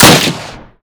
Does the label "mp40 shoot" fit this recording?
Yes